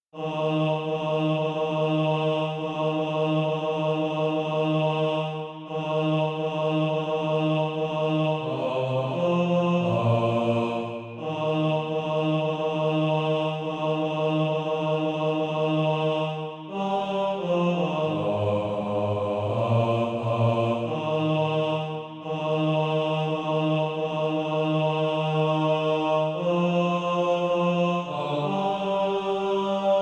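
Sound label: bass, Practise Music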